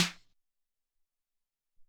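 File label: Dan Snare 5, Alesis SR-18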